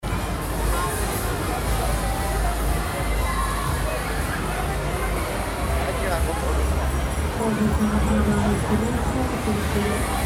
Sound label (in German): Rummel Menschen undefinierte Musik